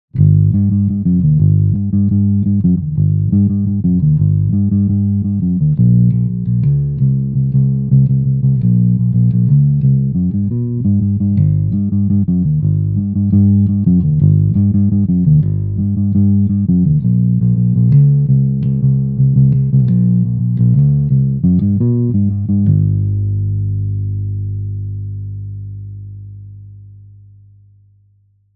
Crush Warm O Bass

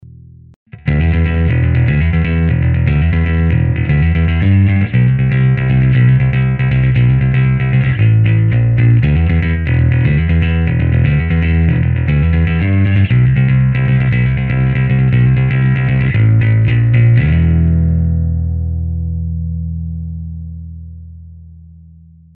Grit; 4 Stroke

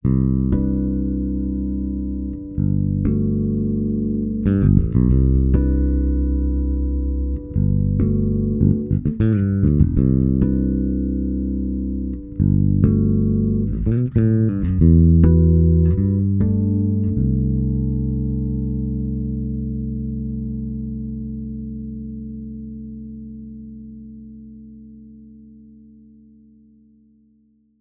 Bass Modern Clean; Bass Butler

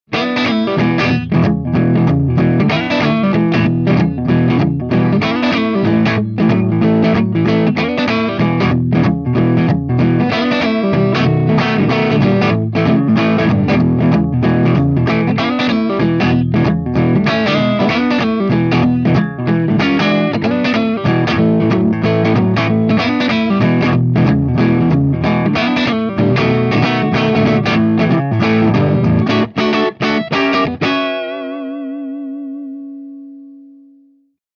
Gretsch Boogie Micd with PPC412

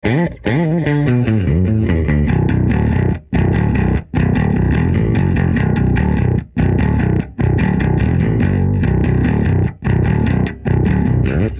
Terror Bass 500 SP410 Pick Ibanez SR1205

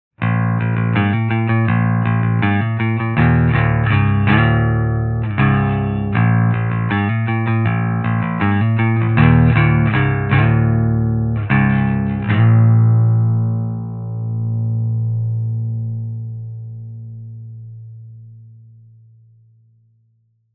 Crush 100 Clean O Bass

